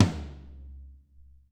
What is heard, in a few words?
Pool Tom, Alesis SR-18